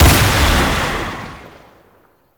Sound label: Wrist Gun Shot; Warframe; Tenno; Incarnon